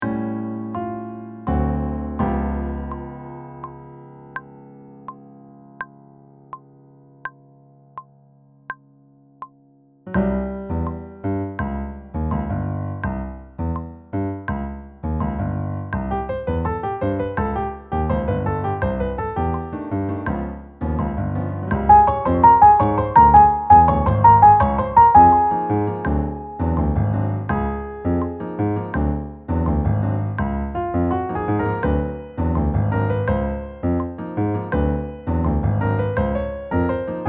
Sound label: cuban 80 tempo complete